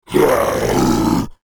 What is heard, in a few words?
scary monster growl roar